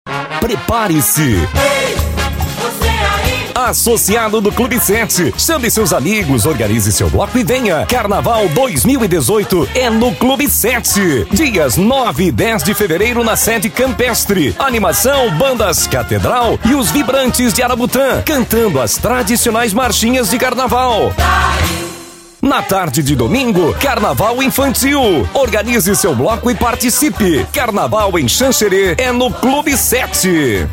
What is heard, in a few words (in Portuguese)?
audio carnaval; carnaval 2018; clube 7